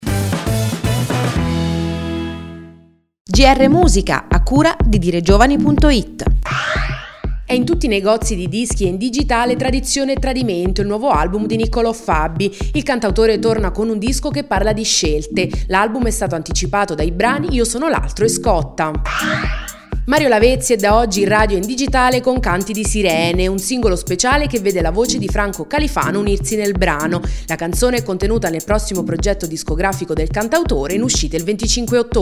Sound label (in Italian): musica